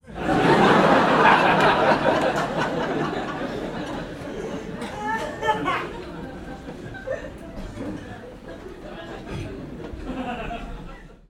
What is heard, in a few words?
human audience laughter comedy club x200 people; komedia brighton comic boom